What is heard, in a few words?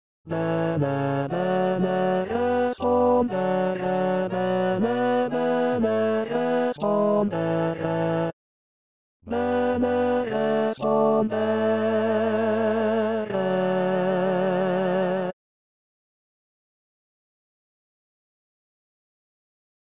Tenor; Bene respondere